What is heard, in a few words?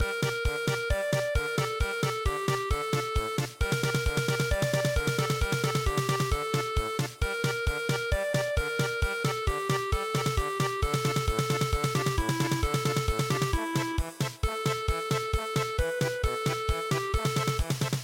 bousan polka